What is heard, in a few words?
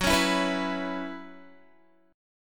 Gb 7th Suspended 4th Gb down Guitar, chord